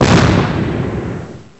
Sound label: ambience